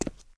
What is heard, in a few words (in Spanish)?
Paso zapato cemento; yesterday origins gamerip 2016 switch ps4 pc ios macos